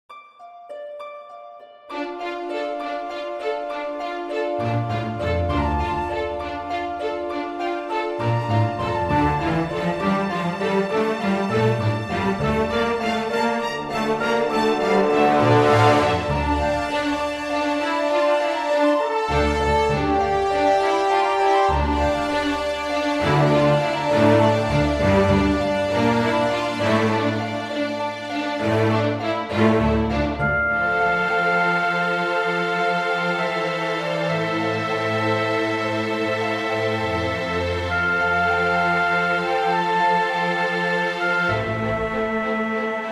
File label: angel waltz